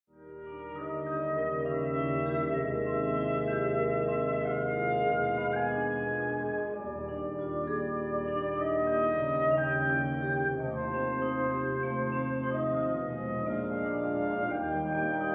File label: Piano Concerto